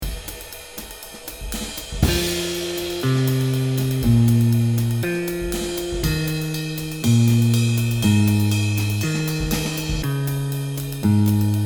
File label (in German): Wechselbass, gitarren